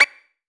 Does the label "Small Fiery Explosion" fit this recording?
No